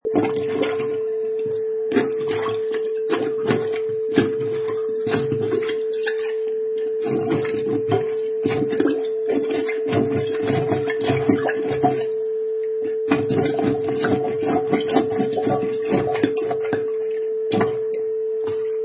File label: Toilet Plunge